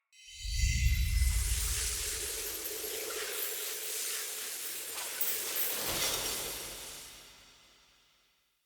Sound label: Capture Start Swirl; Encounters; Animotes